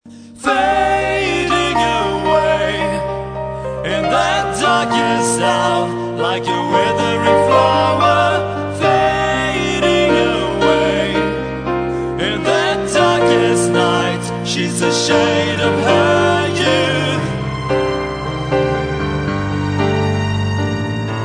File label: piano